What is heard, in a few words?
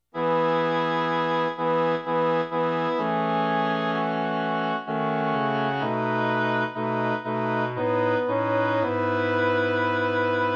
Nunc Dimittis Bass, choirs; Luvaas; Reunion 2025